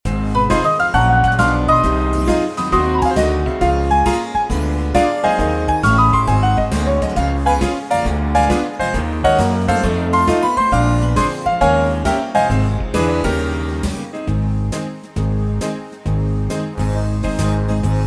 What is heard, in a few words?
Movie Swing; wersi